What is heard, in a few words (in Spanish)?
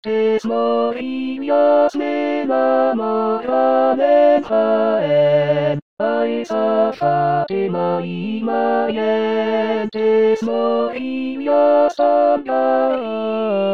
TODOS voz; Coro "Con dos Bemoles"; SATB